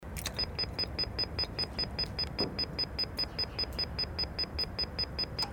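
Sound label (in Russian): звук домофона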